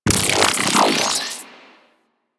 Shockwave Electrical Aura; Warframe; Venus; Venus Terra Shockwave Moa; Abilities

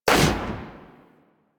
Protea SMG Shot C

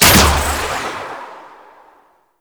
Bow Fire; Warframe; Weapons; Antler Bow; Prime Cernos

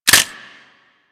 M16 Pull Out Clip, rifle; Grineer

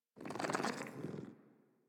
Kitten Growly Purr; Enemies; Warframe; Catbrow

